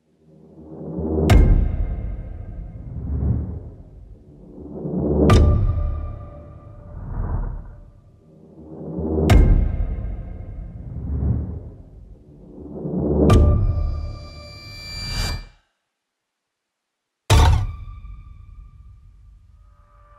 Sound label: Fear horror trailer